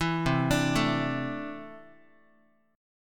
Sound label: C 6th Add 9th C down Guitar; arpeggiated; JGuitar